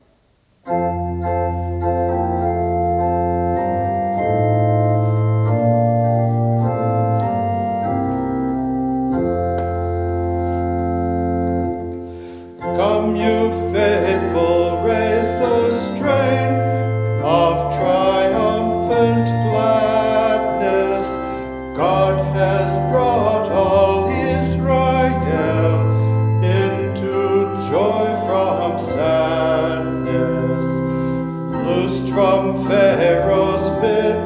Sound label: hymn; Come Ye Faithful Raise the Strain